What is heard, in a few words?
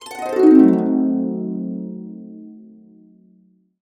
C DIM7, Harps; USB Soundscan vol.62 - Complete Harps; AKAI